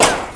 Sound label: Reflect; Risk of Rain